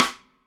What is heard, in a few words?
normal hitnormal; osu!